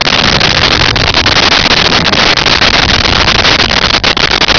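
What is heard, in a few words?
voice growl loop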